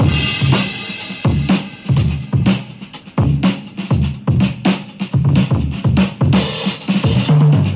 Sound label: Drum loops, Funky